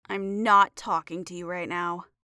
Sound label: moody